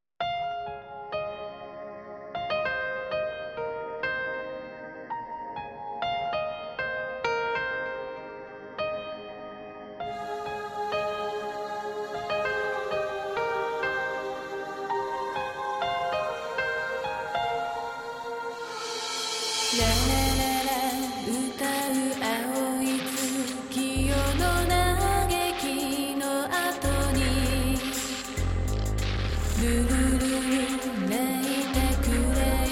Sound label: Sad Story